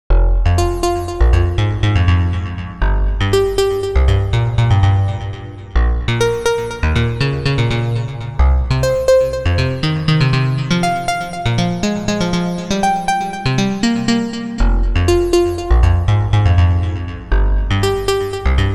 ceil arp; the space soundset

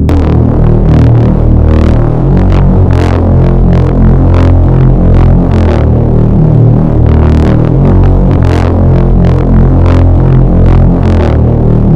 bass wet; folda; sentinel av

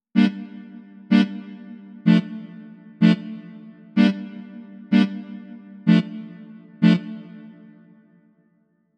melodica chords; etheral melodica; soundfingers

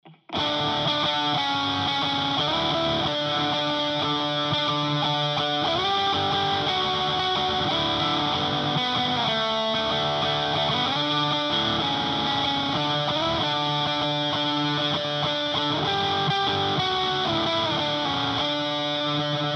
lyrical luxe, guitar amp; cross the bridge guitar amp suite; marco dodin

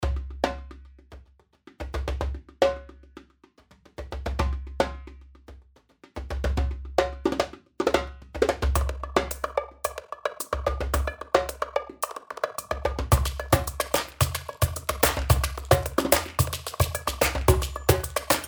world percussion; gorangrooves; handy drums

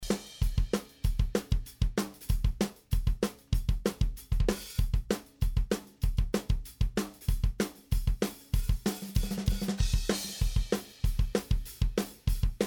192bpm bounce groove, pop punk; drumdrops

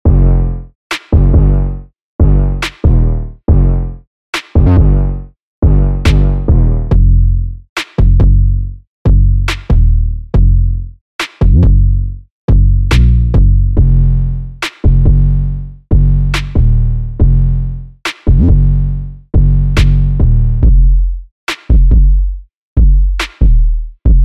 808 box; 808 box 1 by iceberg audio

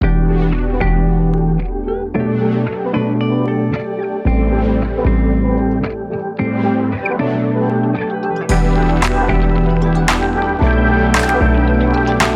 cry funk; triaz by wave alchemy

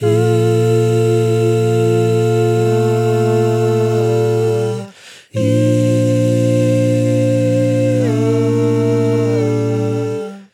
vocals dry; airspace hybrid reverb and delay; modeaudio

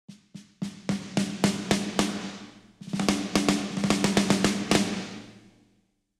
snares, punk rock kit; wild boar; klangmacht

